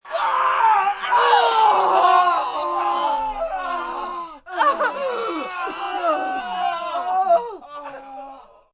Group Scream Pain, Screaming, group screaming in fear/pain (5-10 people) (human voice); Screaming Sound Effects